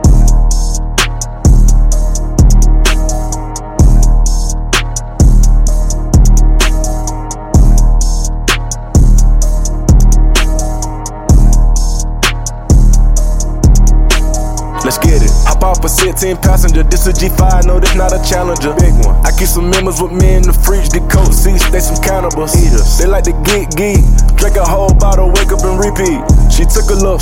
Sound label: Hotel Lobby, klingelton